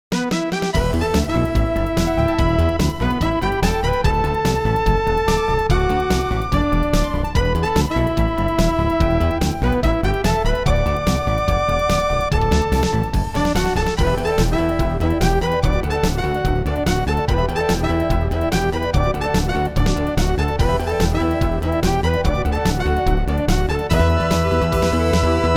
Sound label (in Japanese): バトル; All Sounds of Illusion City